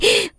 gasp

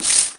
shook

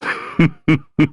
giggle

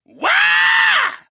wahhh; monokid

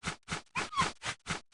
scrub

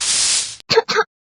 desk; dusty

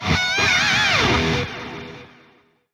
stylin

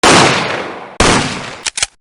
long gun

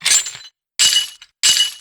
head tap; blackquill

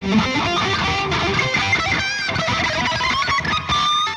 rockin

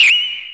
bling; umi

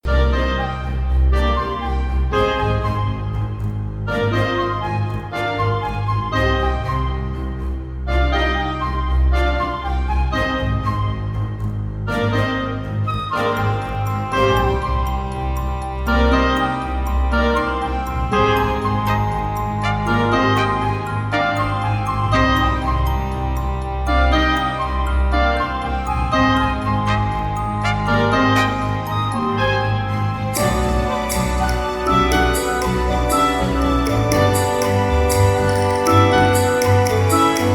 questioning (orchestra)

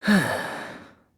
sigh; dr2 komaeda

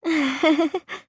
giggle; chihiro

